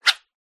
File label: sport fishing rod cast whoosh whipp in air; zapsplat